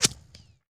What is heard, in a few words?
coil; quake